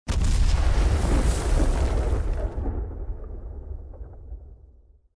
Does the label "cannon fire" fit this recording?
Yes